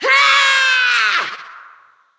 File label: Attack Heavy, Creature; Fjola